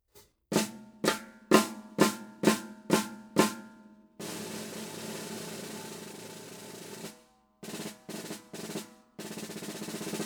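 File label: Craviotto 5x14 Oak; Unlimited Edt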